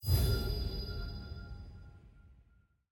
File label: readycheck accept button hover